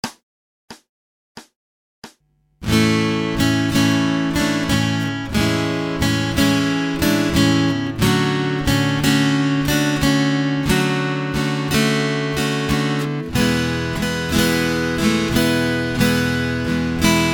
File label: Acoustic Accompaniment, acoustic guitar